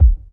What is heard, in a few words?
raw kickdrum sound b key, kick single hit tuned to the B note; kick drum samples